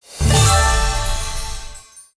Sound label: nice win; Hot Fruits 20